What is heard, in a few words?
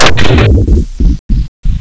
empty, plasma weapons; lmctf